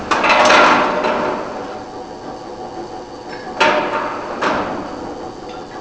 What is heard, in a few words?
Pipe Machine